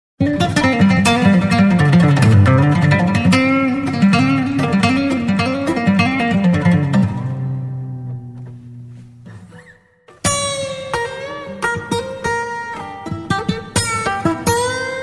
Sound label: Disjunction Blues